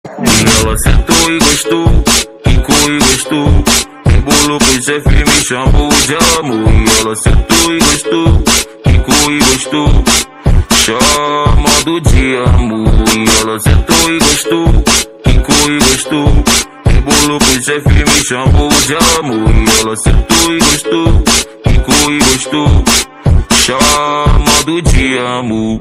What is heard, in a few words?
Funk